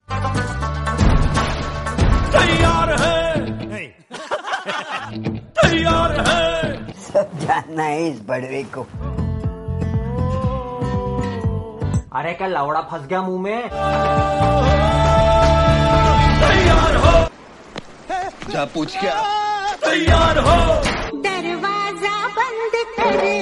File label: sports musical song tone ringtone